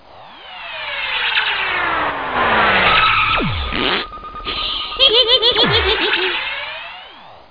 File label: space cloud; T.J. Finds a Friend